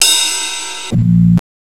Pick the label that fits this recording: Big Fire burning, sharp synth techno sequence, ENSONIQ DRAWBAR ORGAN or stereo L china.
ENSONIQ DRAWBAR ORGAN